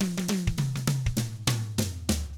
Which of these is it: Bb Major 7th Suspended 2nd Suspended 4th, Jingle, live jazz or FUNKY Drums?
FUNKY Drums